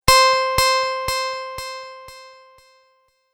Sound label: Tap Play Delay, button; multimedia